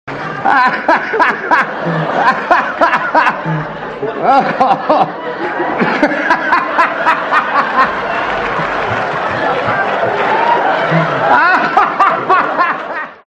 hahahahahahahahahahahahaha

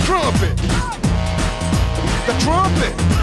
the trumpets

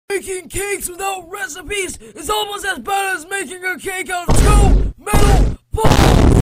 metal balls

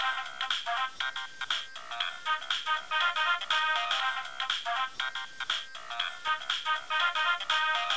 ringtone; gta iv

